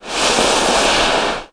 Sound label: sld snd; thq bowl